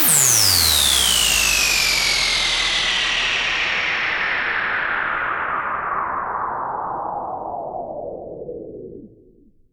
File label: Downsweeps